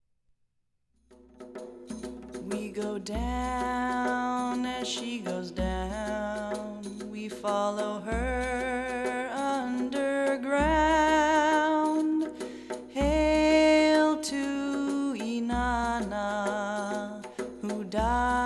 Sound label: Chants